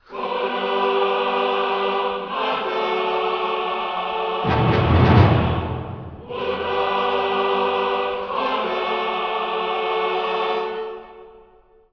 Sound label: chorus full